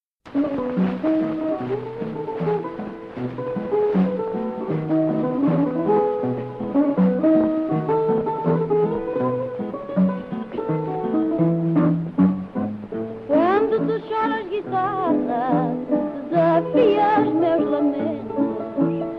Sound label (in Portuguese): Fado